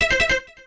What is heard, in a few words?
Pause, Sound effect; SM64